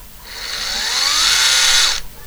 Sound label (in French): son ok